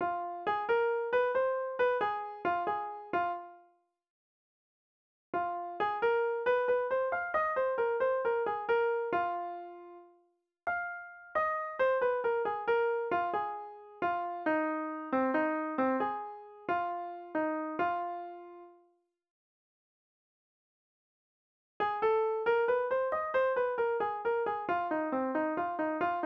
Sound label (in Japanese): 多音 ブルース アドリブ